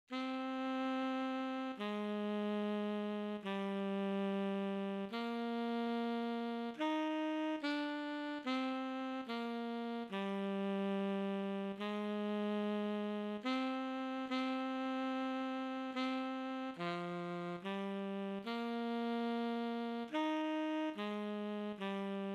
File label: tenor sax celtic air and dance